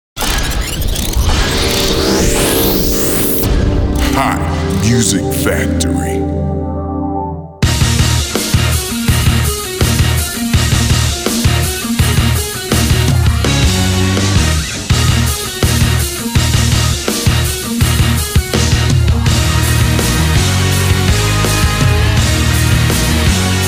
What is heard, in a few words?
fire hot rock